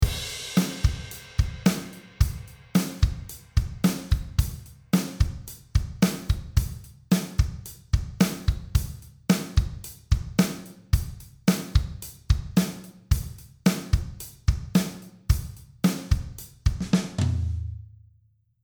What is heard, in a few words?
altiverb 8 xl drums neve room; audio ease